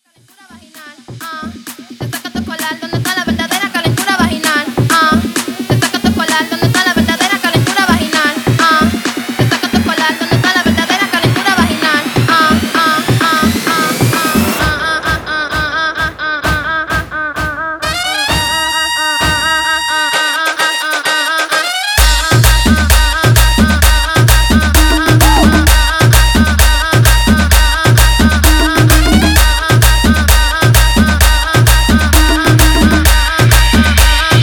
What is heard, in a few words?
guaracha remix 130 bpm